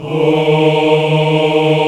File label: VOX, African Aahs; Roland LCDP11 Africa VOL-1